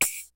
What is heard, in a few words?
FUNK, DRUMS; Kid Nepro; Elite